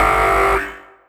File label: siren